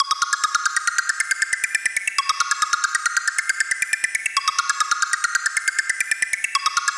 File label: FX Kicked